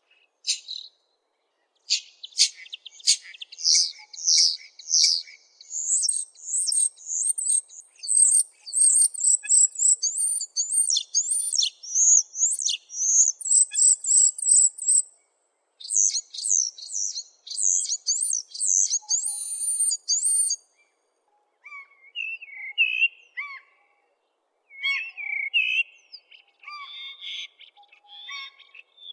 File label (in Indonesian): jalak eropa variasi